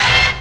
Orchestra Hit; MMX

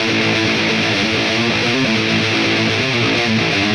Rhythm Guitars; Anger Therapy; Sony; ACID Pro 7.0

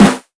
Snare; Ableton Live 12 Lite Core Library

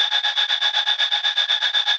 switch on; puzzle; terra

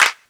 Clap; Ableton Live 12 Lite; Core Library; Drums

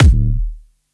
Kick; Ableton Live 12 Lite Core Library

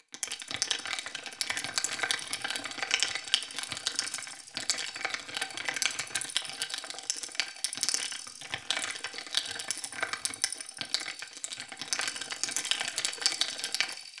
Sink Noise; Loops; Texture; Ableton Live 12 Lite; Core Library

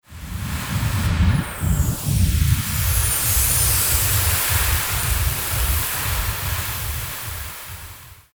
Ancestor Waterfall; Barrens; Journey

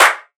Clap, Drums; One Shots; Rewrite; Ableton Live 12 Lite; Core Library

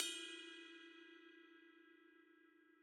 Ride Cup; Drums

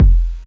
Kick

